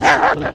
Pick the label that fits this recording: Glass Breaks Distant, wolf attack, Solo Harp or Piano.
wolf attack